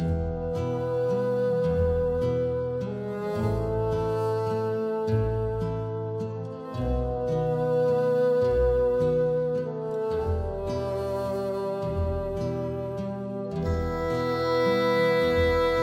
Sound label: Smug Ship Horn Guitar